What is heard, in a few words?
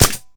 Gun Paintball Shot; SFX Kit; Weapons